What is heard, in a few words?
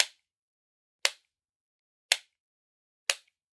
Generic Click Plastic Tiny; boom library; The Interface